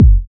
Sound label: smooth bottom end steel kick drum sound F sharp key, bass drum sound